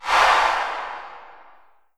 noise fx; Masterbits; Switch FX

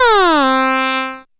syn, Dance Synths, Acid Bubble; ProSamples vol.37; Best Service

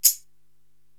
Tambourine Pull, Korg TR-Rack

